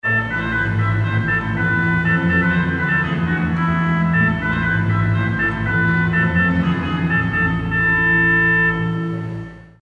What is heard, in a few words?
Hautbois